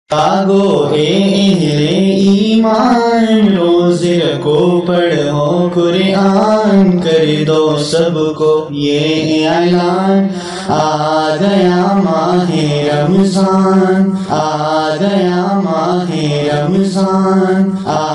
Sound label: Ramadan Ringtone